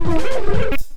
plastic; OSWorkshop

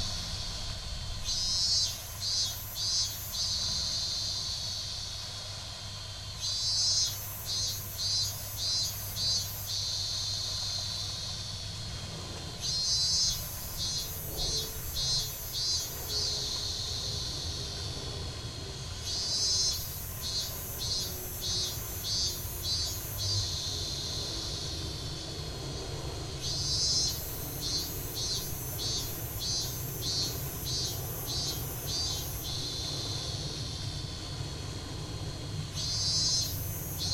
Cicada JP; sony mao; Sawako Drop Box

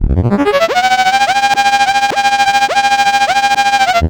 sinus snake; OSWorkshop

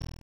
little percussion; OSWorkshop; .microsound repository

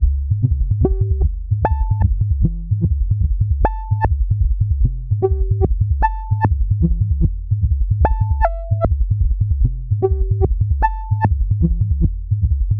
bass 16; OSWorkshop

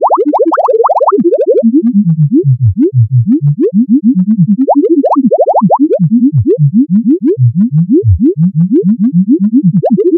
hydro boil; OSWorkshop

